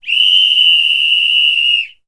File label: Ref Whistle Half Time